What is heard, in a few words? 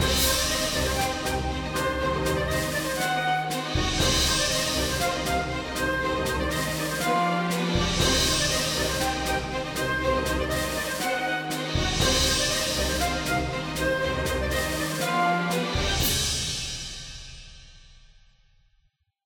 Military Melody Snare; tintin reporter cigars of the pharaoh